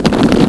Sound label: Swap; Mr. Cat's Quest 3